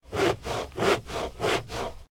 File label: saw log large, human actions